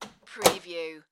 Microwave Door Close

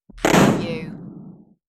Smash Hit

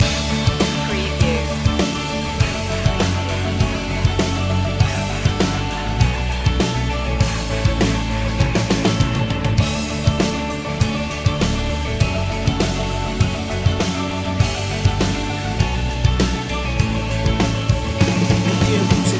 live loop

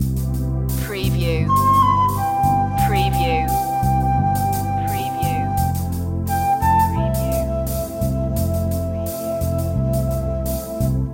loop

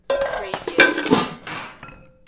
Metal Debris Impact Short Medium

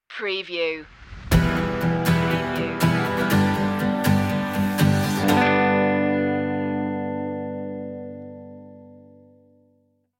optimista stinger

